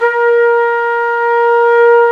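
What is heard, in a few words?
WND, Hybrid Winds, Flute & Flugel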